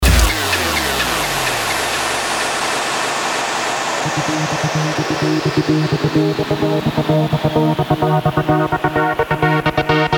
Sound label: electro dub